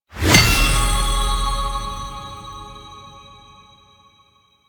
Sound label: UI Sockets Complete; DE; Warframe; Fusex Treasures